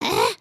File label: fart